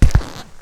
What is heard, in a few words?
Step Squeaky